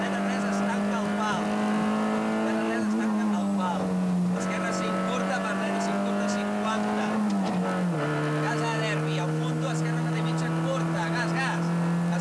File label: motor